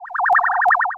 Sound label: Cry